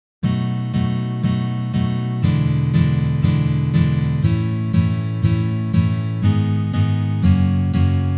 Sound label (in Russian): Uprazhneniya dlya igry akkorda B7